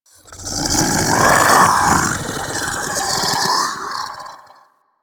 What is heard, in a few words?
Infested Splitter Joined Vocal Idle; Warframe; Grey Strain